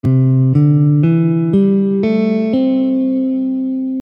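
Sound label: Pentatonic major C, Guitar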